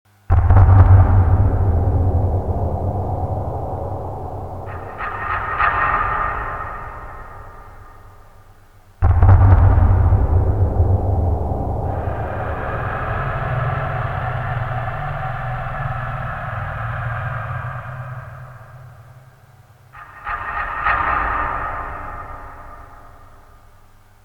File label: cave; quake2; chaos